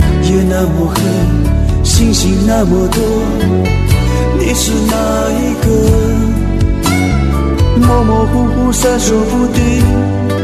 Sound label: Chinese Song